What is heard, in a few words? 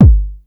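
909 bass drum; 300 Drum Machines; Yamaha Motif ES-Series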